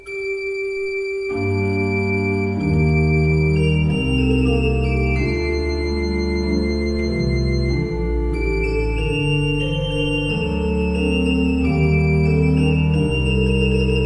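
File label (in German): Prengel 14 II P, pfeifenorgeln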